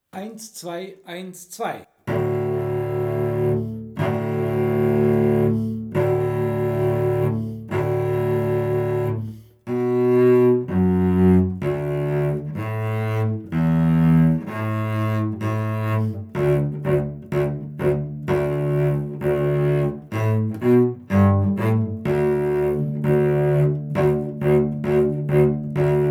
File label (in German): Stimme E; Reimlingen; Respighi